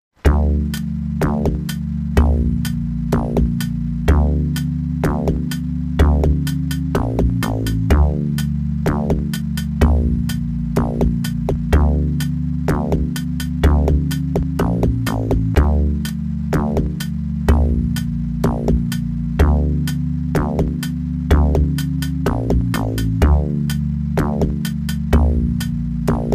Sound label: Menu Song; Duke Nukem II; Humble Retro Bundle